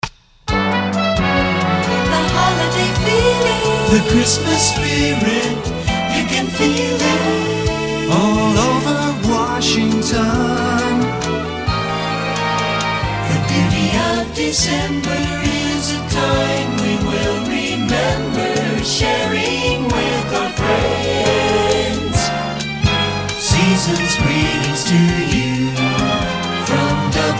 christmas kit the holiday feeling full vocal, jingles